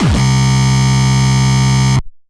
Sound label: free frenchcore kick